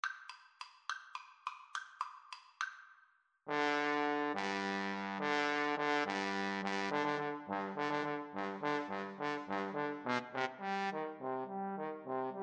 Trombone Duet

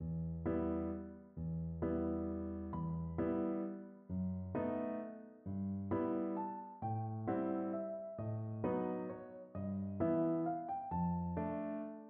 fantasie, piano

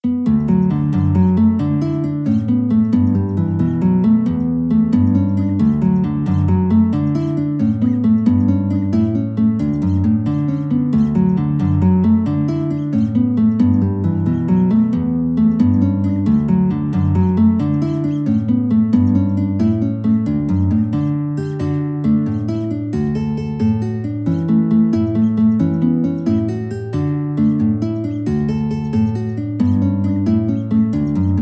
guitar

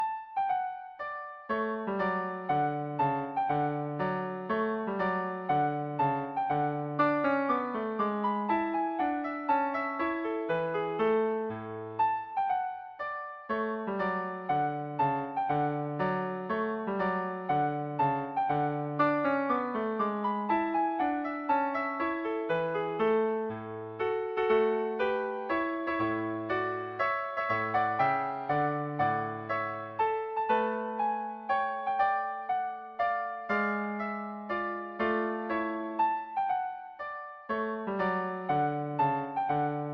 piano

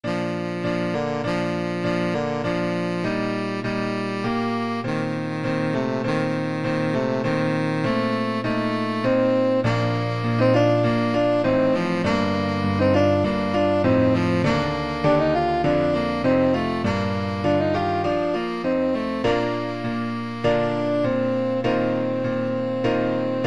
tenor saxophone

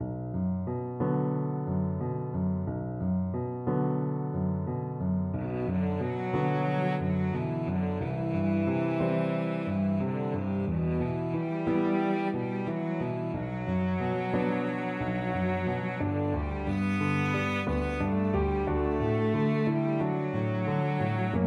cello

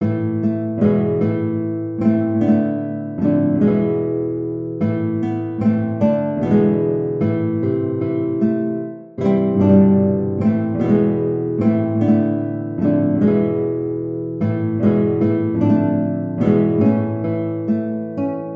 Carol guitar; Coventry

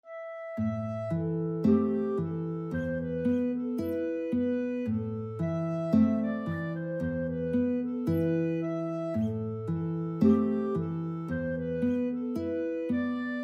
guitar clarinet duet

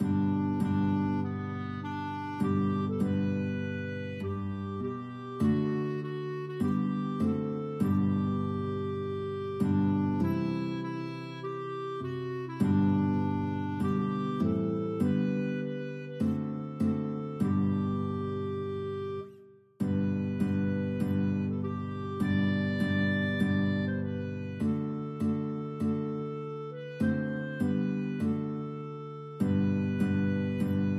guitar clarinet duet

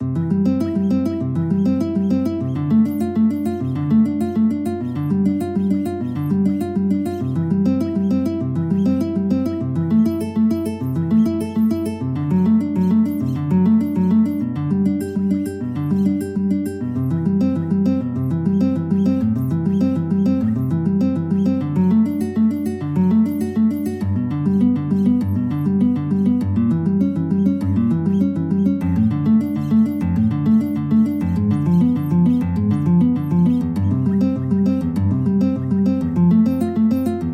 prelude, guitar; bach

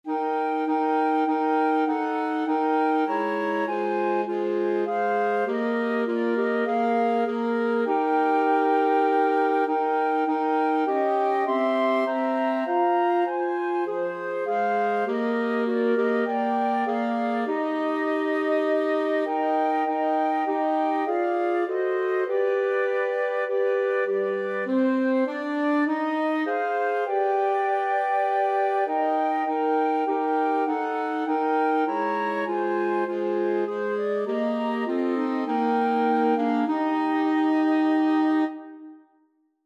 flexible mixed ensemble 4 players

